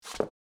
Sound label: Concrete Heels Scuff; コンクリート ヒール 擦れ音